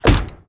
car squish; CrossyRoad

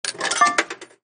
Slot Machine Insert

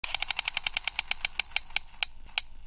Prize Wheel

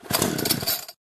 robot hop

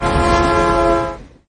train pass shorter; CrossyRoad

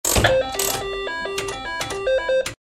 play slots machine

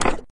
log jump; CrossyRoad

